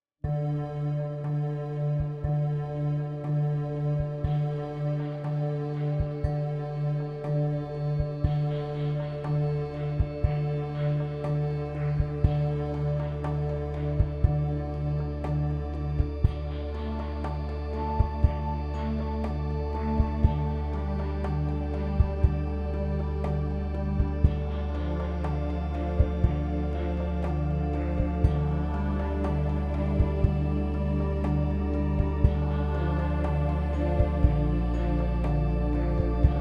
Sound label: Spooky Ghouls, video game